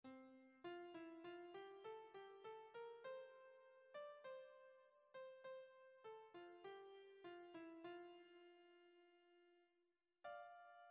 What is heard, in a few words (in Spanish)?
flauta 3VOZ; Ya suena la flauta